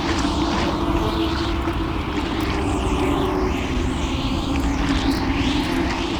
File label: decayed loop; LoL; yorick